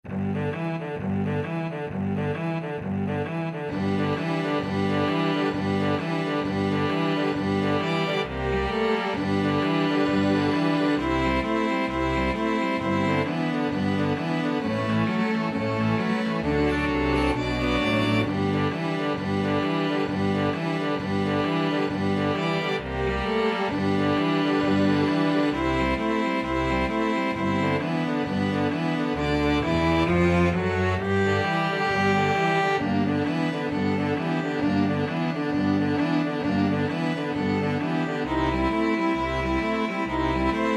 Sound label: jingle bells boogie, string ensemble